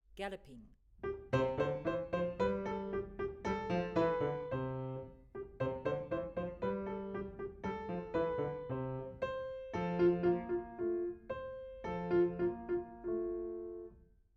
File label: Galloping